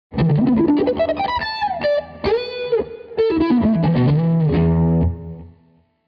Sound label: octave Lick